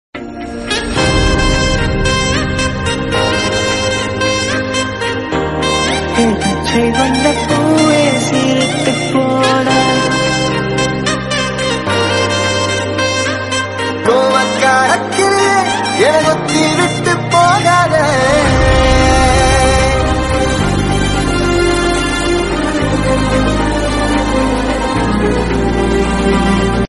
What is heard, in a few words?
Trending Tamil Love Ringtone